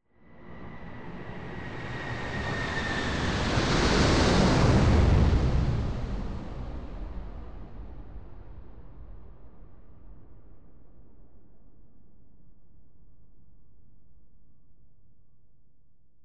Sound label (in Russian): Samolet proletajuschii nad golovoi